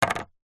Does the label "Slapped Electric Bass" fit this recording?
No